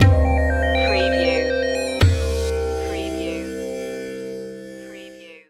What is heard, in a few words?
techno india stinger